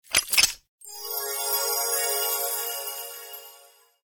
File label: Item unlock; Lethe